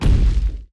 dull Explosion; Mindustry

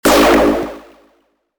spawn; Mindustry

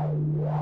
tractor beam; Mindustry

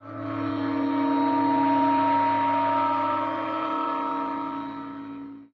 build; Mindustry

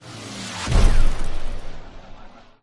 bombing; attack sounds; Unciv

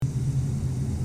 conveyor; Mindustry

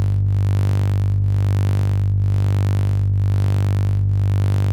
electric Hum; Mindustry

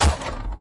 crossbow; Unciv

